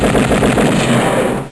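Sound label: machine guns; PARADISE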